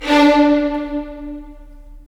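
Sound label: strings violins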